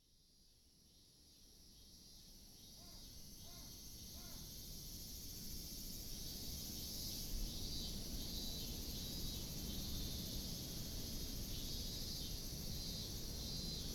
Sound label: sound scape evening, 屋外